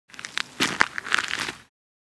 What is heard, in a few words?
Dirt cracking with debris